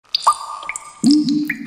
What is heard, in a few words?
Water Droplet